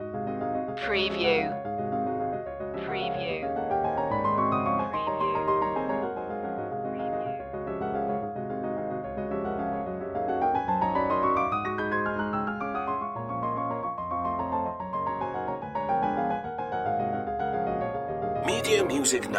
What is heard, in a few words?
etude in d-flat major vivace legato; chopin; op 25 no 8